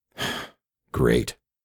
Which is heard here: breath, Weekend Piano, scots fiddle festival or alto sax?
breath